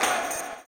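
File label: tambourine, 160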